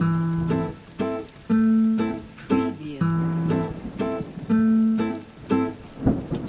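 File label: Guitar 3/4 Waltz 120BPM Am; Walts Stec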